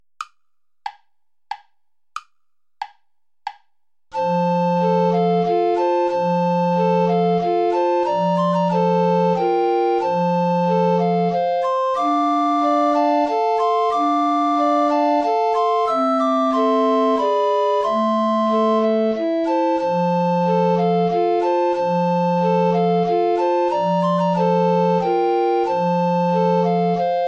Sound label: waltz play along without bass recorder; brahms johannes